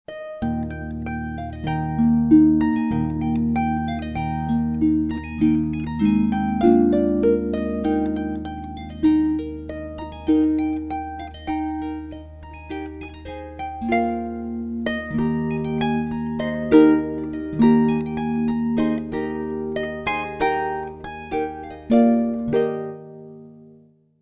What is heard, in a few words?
Spring solo, harps